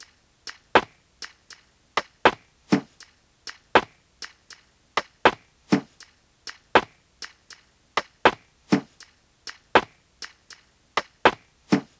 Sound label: Top Drum Loop, 80 BPM; Cymatics; Eternity Sample Pack